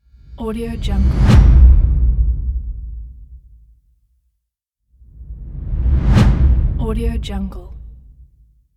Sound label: impact whoosh